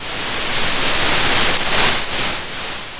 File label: Sound of waves crashing on a shore